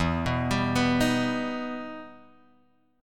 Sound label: E Minor Major 7th, Guitar, Drop D; 2,2,5,4,4,x